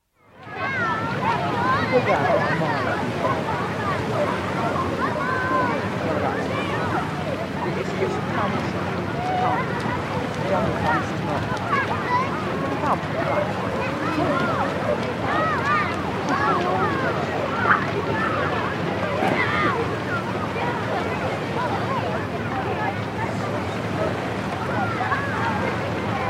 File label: busy beach noises